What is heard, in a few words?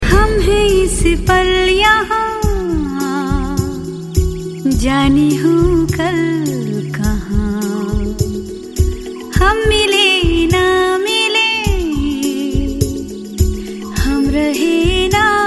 female; kisna